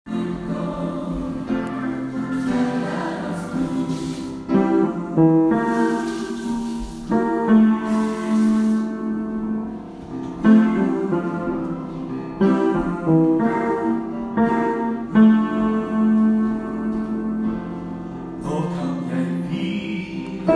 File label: tenor; salige visshet del 2; åfjord